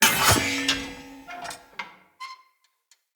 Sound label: Light Mirror Hit Move D; Puzzles